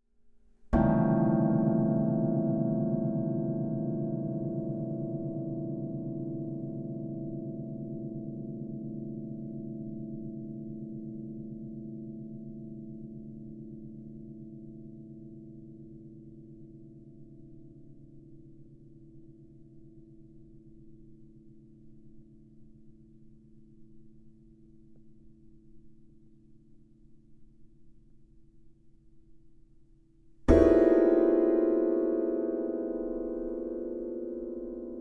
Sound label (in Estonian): tambora, Kannel, lüüa keeli peopesa või käelaba küljega. Tulemuseks on suhteliselt raskelt määratava kõrgusega klaster.